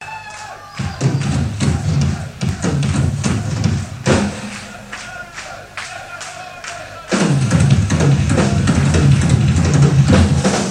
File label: Drum Solo; Live In London; 1991